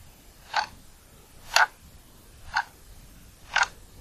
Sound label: Clock